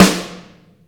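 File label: wet snare; AIRSNOFF1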